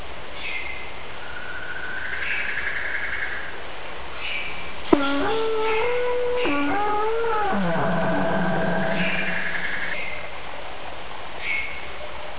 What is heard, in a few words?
SONATA